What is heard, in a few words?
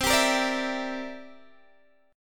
C 9th, Guitar DADGAD, chord